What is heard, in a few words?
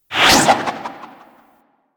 Grapple Throw; Warframe; Berserker